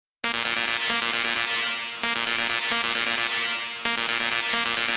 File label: tango sms